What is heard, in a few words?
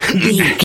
voice male effort short